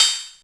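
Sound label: Yamaha RS7000 hi-hat cymbal D7; Yamaha RS7000 Cymbals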